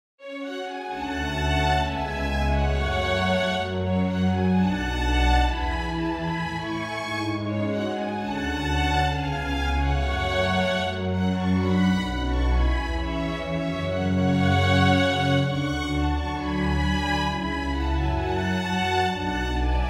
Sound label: strings; orquestra de cordas